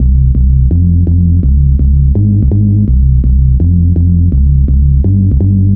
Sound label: Menace Throb Bass, Bass Lines 166.5; Jungle; Zero-G Jungle Warfare 1, 2 & 3; 90 s Sample CDs